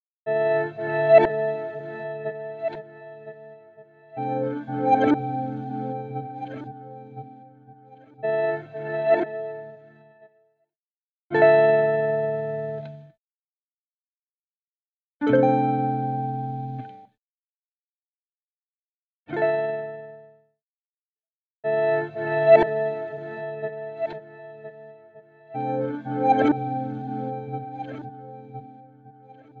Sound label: Reversed Long; Preset: Reversed Long; Physion Mk II